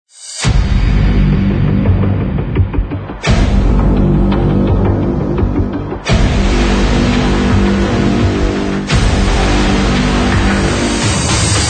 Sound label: Action Trailer Intro